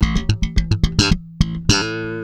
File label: A, Bass, 96 BPM; Ultra Freakin' Bass; Sampleheads